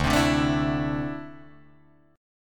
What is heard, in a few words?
D 7th Suspended 2nd, Guitar, Drop D